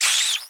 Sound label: Rat Death